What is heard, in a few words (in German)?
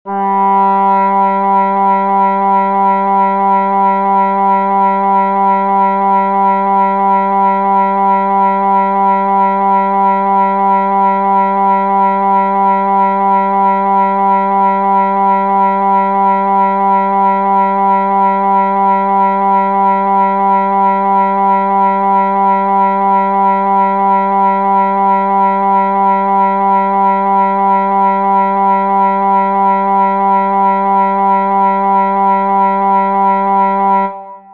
800Hz Oboe